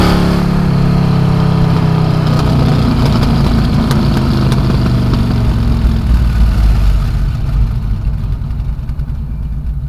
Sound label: EXHAUST DECEL